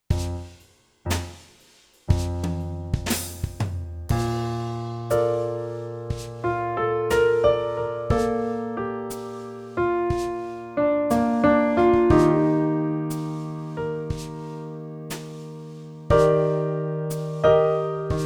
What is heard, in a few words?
Long notes improvisation Bb